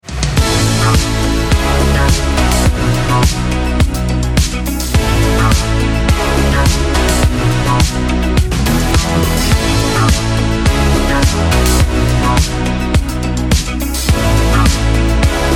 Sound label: INSTRUMENTAL RINGTONE